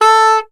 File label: Soprano Sax Portato A3; Orchestral; DirectWave; Varazdin Orchestral